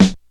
Fluffy Snare One Shot F# Key, snare one shot tuned to the F# note